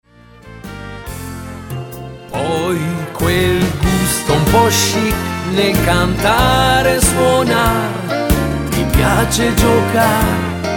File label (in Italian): radio slow